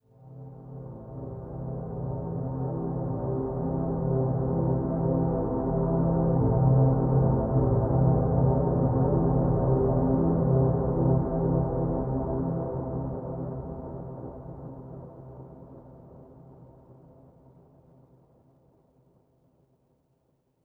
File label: Overlay Drone